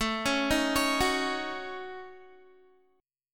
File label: A 6th Flat 5th, Guitar; A6b5 arpeggiated